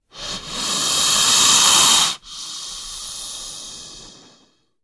Snake, Bull Inhale Then Exhale. Close Perspective.